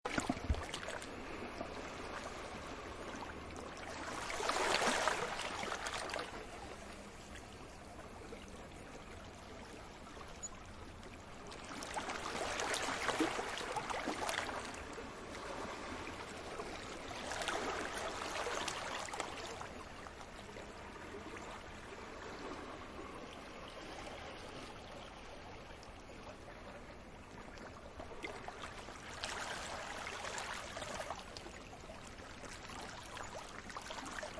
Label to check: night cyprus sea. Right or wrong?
Right